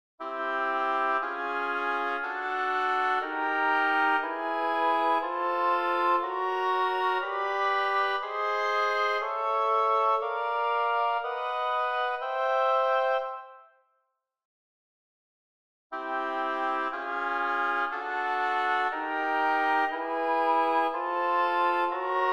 3 Oboes Chrom 12eq Qtr Com Mntn Zarlino 12 of 17 Werk 12eq